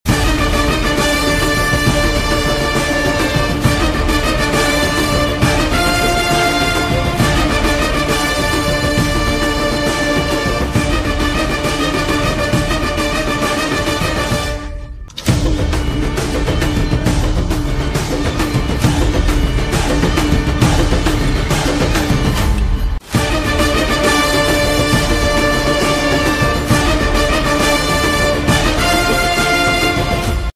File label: bgm ringtone